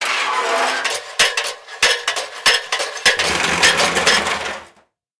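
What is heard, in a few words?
metallic crash from falling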